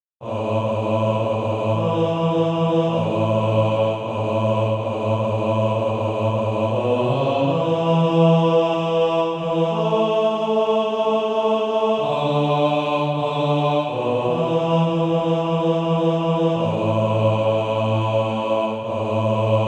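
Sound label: bass, Sheet music (SATB) including Practise Music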